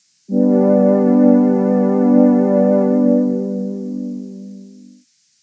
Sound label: Choir; j106